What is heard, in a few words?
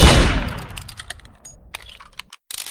hit shooting